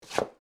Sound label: Concrete Heels Scuff; コンクリート ヒール 擦れ音